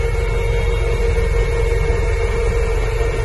inside cell max stress loop stereo, Lynx internal audio loops